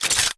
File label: reload, weapons; cstrike; valorant; prime vandal